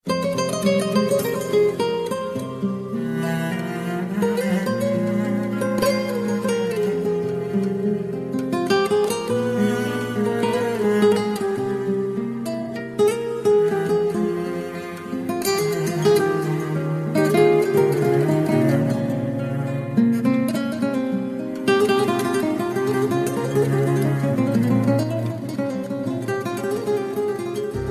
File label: Very Sad Bollywood Guitar